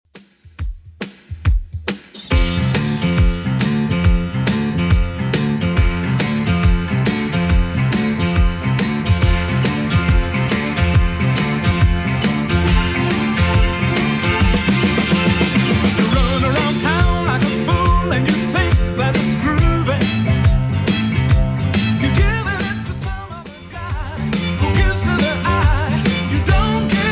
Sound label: 70s Redrum 139bpm